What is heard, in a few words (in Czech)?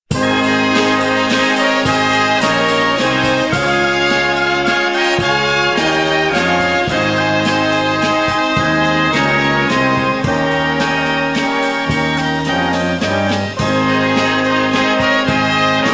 zvuk letni sen orchestralni